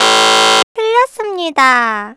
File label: fail